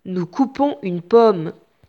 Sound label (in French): vocal